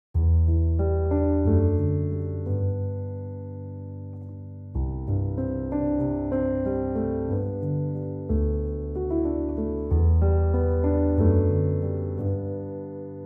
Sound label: song calm nature; dolphin tunes